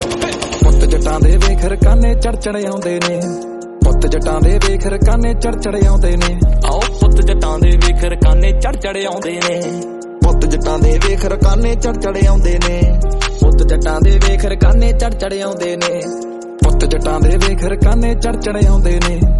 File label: punjabi